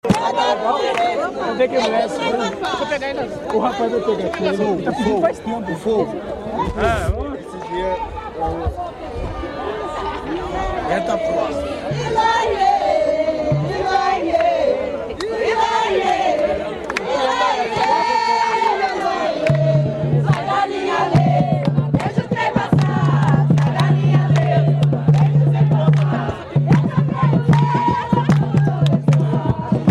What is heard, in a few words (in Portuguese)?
jongo de tamandare sai da linha